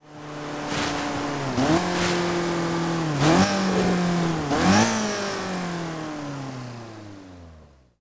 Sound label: throttle off, vehicles; gmod; tdmcars; golf2